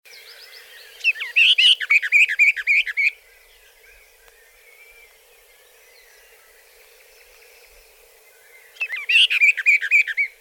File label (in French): Fauvette orphee